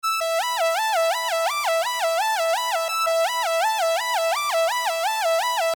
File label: Main Lead solo